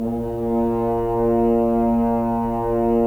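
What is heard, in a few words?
Solo French Horn, Brass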